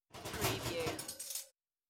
Moving Cutlery; beanstalk audio

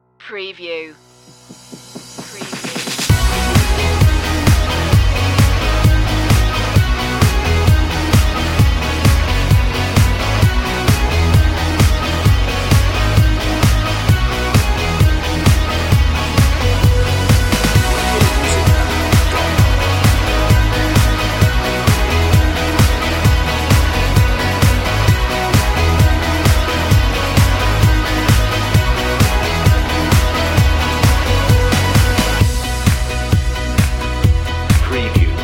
neon guitars

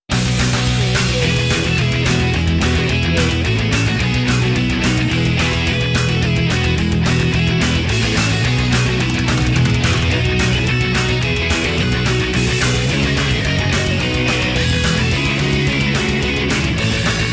rock the halls deck the halls

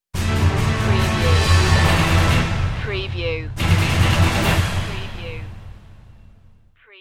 sting no choir

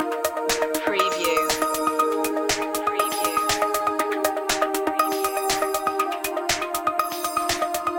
radio waves loop

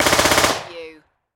Submachine Gun FN P9; Sound Effects Bible

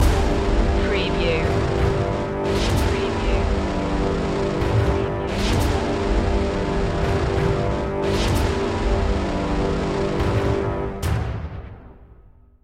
pirate attack stinger

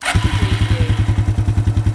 Quad Bike Start Up Engine Perspective

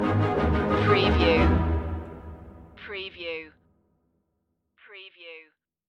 ominous forces stinger

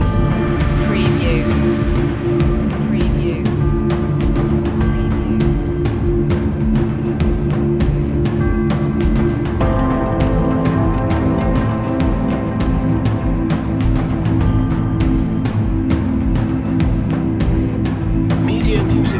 ambient army loop

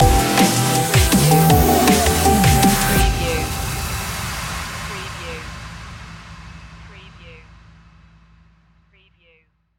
moving up stinger

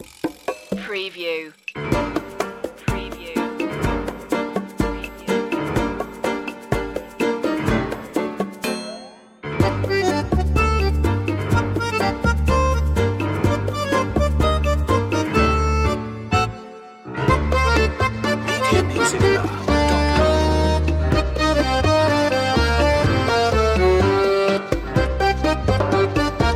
drama tango

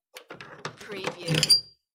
Heavy Key, Move And Pull From Lock

